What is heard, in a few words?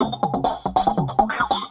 Throatbeat